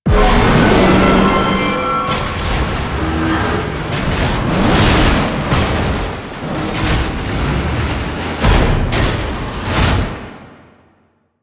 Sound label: Tank Intro; Warframe; Nineteen Ninety Nine; Quests